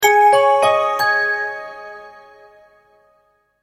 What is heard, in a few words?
multimedia logo, Musical Identity/Signature; Short Musical Signature / Ident; Sonic Logos; Sound Design; McKinney Sound